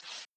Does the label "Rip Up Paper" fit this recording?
Yes